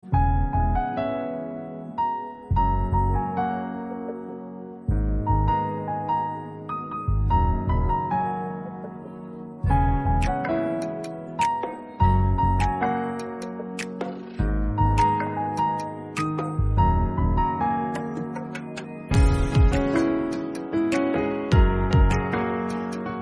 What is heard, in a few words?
Piano Instrumental